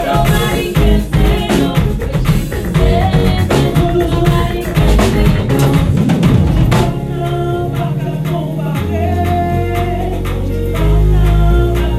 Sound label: Hatian American Gospel Medley